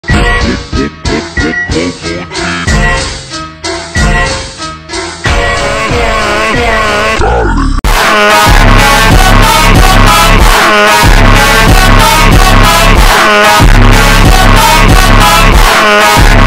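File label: beeper funk ultra slowed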